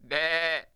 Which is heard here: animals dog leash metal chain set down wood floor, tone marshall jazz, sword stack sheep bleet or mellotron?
sword stack sheep bleet